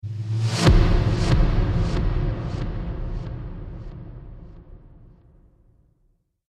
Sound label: Trailer Hit Swoosh; Miscellaneous Impact Sound Effects